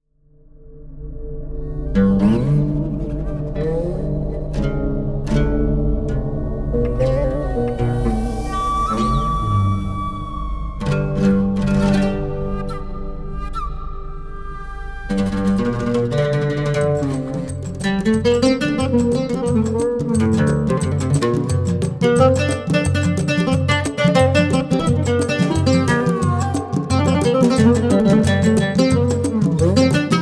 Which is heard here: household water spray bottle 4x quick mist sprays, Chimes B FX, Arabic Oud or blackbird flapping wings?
Arabic Oud